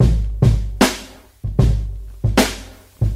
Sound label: bam boop, boombap drum loops